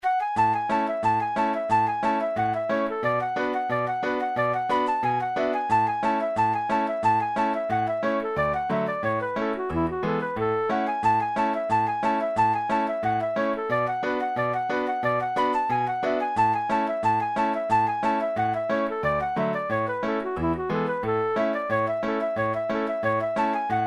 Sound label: Devils Dream A into D 90bpm